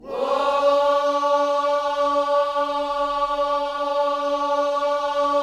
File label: Whoa D 4A, Gospel vocal; Spectrasonics; Vocal Planet Vol.1 Gospel; akai